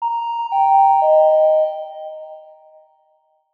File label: public places annoucement tone reverberant